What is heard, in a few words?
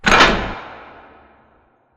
Robot Arm Track Start Close; Boomtown; Titanfall 2